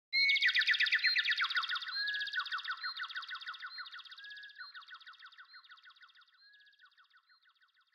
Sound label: tweet bird sound effect, Singing nightingale The best bird song